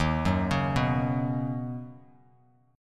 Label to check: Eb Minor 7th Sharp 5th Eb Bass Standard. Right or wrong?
Right